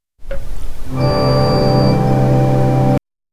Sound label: the sound of a pipe, organ